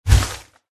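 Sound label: spider attack contact bottom; pauchiha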